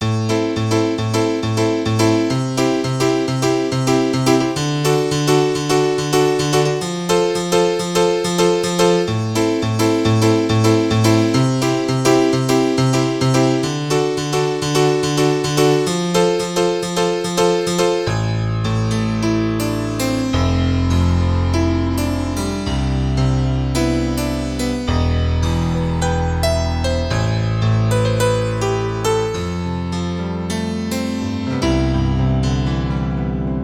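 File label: Acoustic Piano, Roland JD-800; Factory Patches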